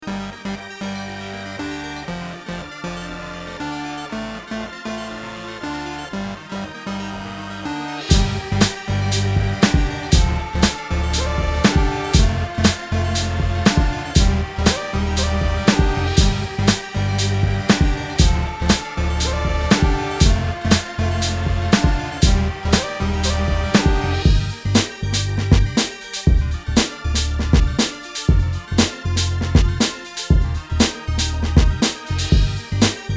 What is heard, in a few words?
Mainstream RnB